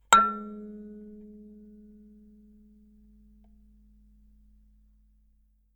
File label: sanza